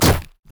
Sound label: minotaur basicatk; LoL; alistar